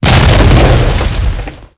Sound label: Small Exp, Explosions